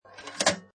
Pinball Sound Little Slide Ends With Metal Obstruction